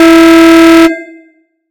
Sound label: Alarm Long